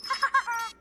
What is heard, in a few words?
bonus nice; Cloudy with a Chance of Meatballs - It's Raining Man